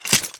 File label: metal